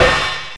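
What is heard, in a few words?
hi-hat open; USB Soundscan vol.11; Drums Dance & Groove; AKAI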